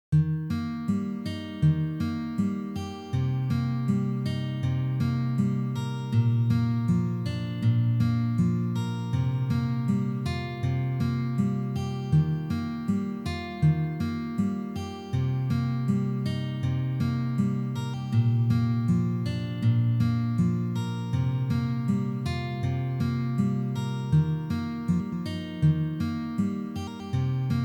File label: Ornamentation Big Song, guitar